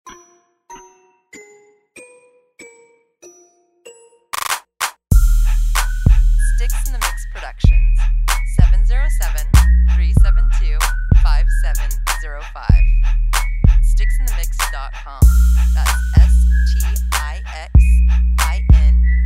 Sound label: Ass Clap